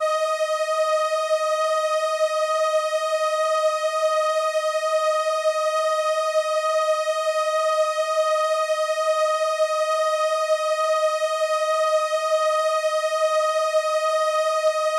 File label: Viola D#6, ARP Solina